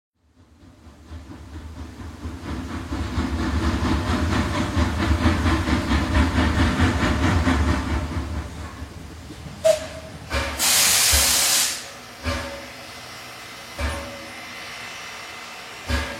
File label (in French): Locomotive